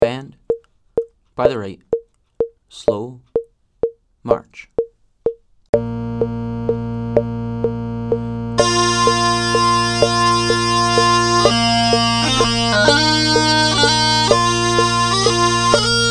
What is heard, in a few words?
pipe section, 42 bpm; Skye Boat Song